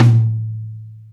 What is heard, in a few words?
Ballad Tom, 70's Ballad; Sonic Foundry (Sony Creative Software); Drum Tools; Laidback; Toms